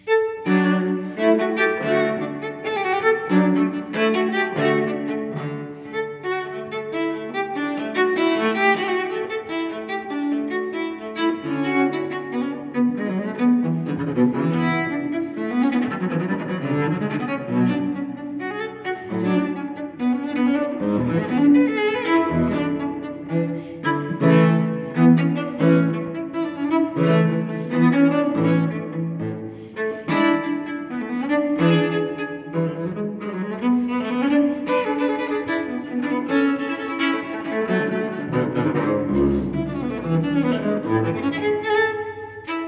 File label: Cello Suite No. 6 in D major, Gigue; VI. Gigue; Bach 6 Suites For Cello; Johann Sebastian Bach; BWV1012